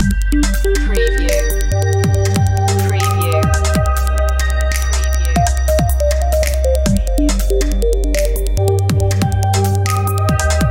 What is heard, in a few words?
subsequent loop